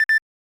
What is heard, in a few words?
Timer Count; bs zelda; snes